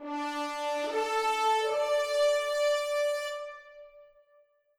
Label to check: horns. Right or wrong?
Right